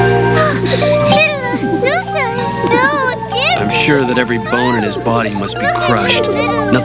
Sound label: dizzy; Ranma